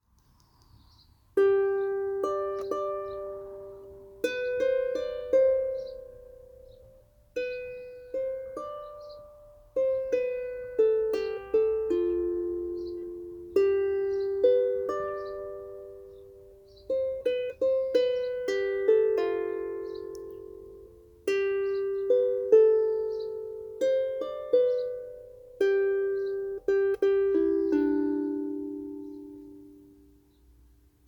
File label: Epitaph on Lyreharp; Seikilos